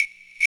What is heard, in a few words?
EQ Perc, Percussion Mix; Equipped Music; Loungin House